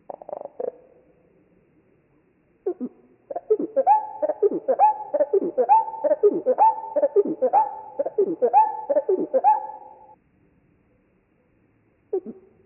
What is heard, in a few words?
slowed 8x East genes mixed songs, Marsh Wrens; Donald Kroodsma